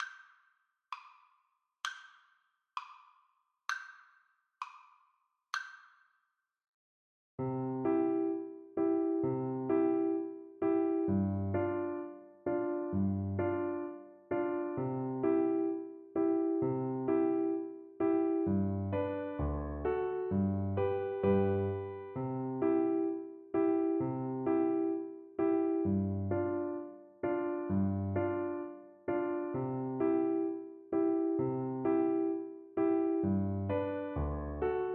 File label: trumpet hornpipe, cello